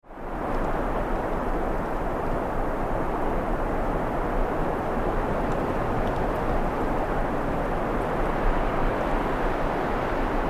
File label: Wind Strong with Open Roar; Weather